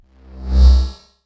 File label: Vibes C; Tones